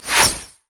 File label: bola strike; LoL; hunter rengar